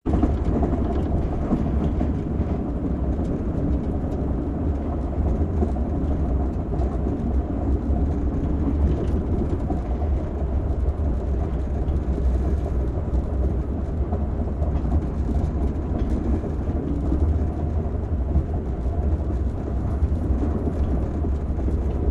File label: Steam Train Boxcar, steam train